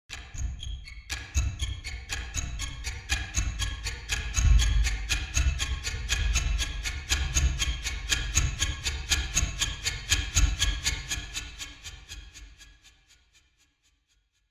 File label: Risers G4; Time Don T Stop